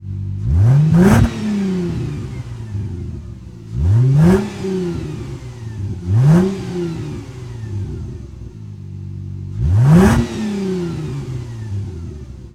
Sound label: Prowler Revs, Automobiles